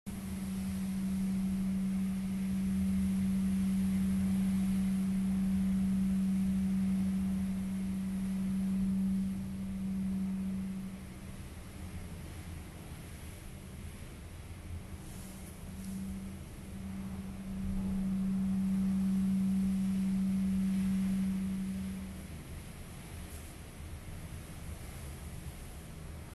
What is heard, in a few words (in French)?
bruit phare